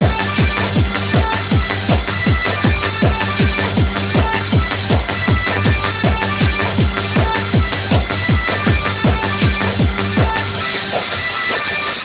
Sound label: acid folk